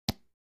Misc. Sports Elements; Popping Lid Closed On Water Bottle